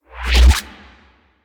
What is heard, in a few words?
Cast Start; Warframe; Bard